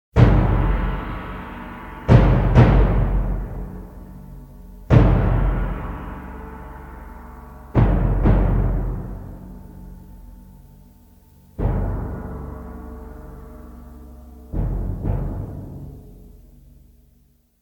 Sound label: fanfara fanfare; a koplends